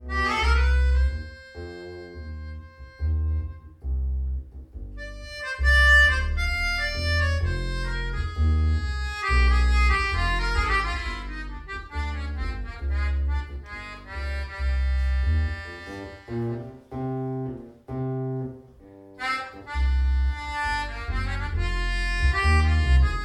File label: Chorus